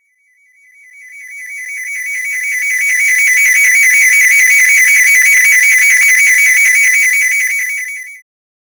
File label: generate a short futurist, warning